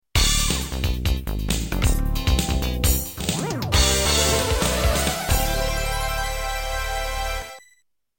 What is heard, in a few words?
music high scores